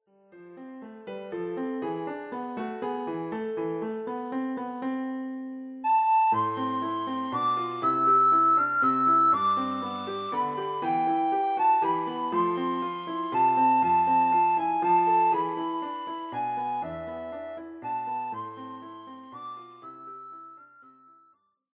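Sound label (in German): Greensleeves Blockflöte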